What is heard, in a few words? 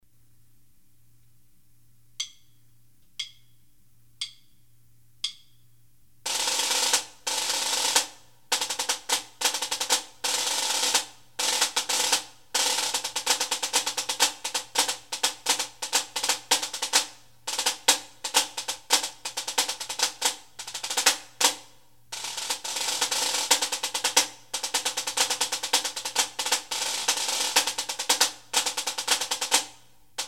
Scottish 3-4